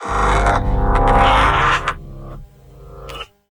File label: monster